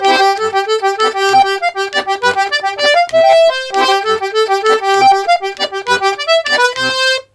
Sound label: BOUREE, Accordions; USB Soundscan vol.40; AKAI